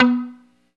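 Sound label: guitar mute; 90's Sample CDs; Club-50 - Foundations Roland; Funk & Mute; Chunk Mute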